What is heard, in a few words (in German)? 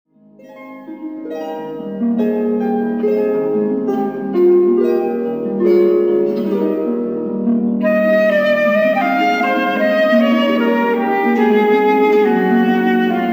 harfe; widor